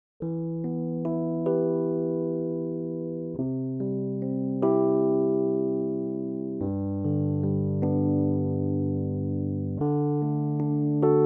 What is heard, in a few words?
rhodes